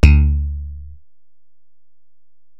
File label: Bell, Alesis DM-PRO